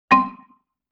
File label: Xylophone Chord C; Vintage Cartoon; Musical Elements